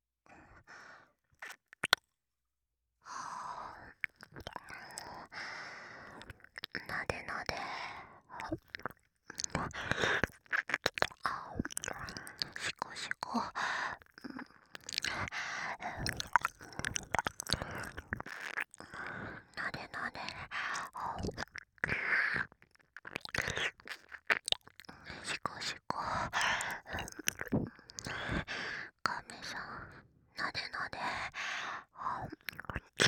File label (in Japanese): 耳舐め なでなでしこしこ